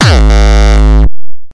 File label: Hardcore kick long